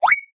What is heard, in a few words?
menu open